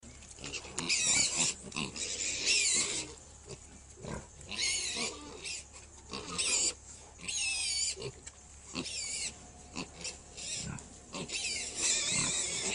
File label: piglets squeal; animals